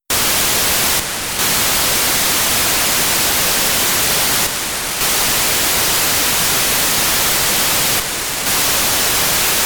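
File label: MINXSS, satellite; AIRSPY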